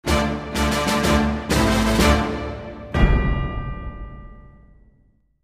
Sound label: declare war western